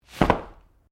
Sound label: Umbrella Open